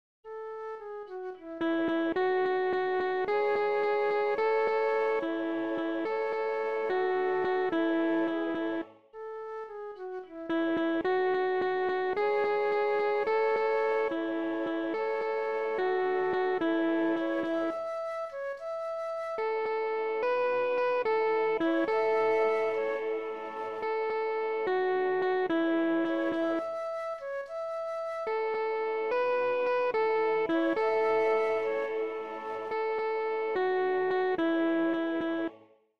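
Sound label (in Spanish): Sopranos, MIDI; Aya Ngena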